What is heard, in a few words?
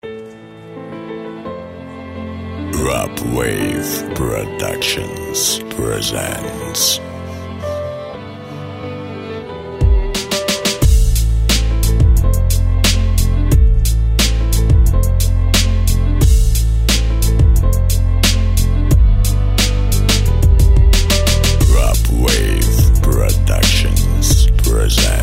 Mini Piano Beat; minus pianino